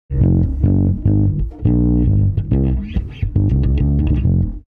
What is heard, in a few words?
slight bass dirt